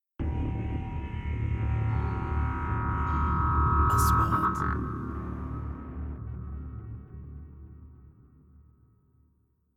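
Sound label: 3D SFX Trailer; مؤثرات تشويقية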